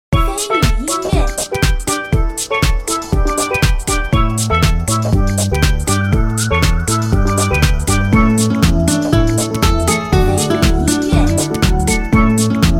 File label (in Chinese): 轻快-欢乐-活力